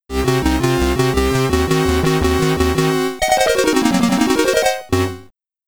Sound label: SID Stereo; Dimensional Fortress DOH; Arkanoid; Commodore 64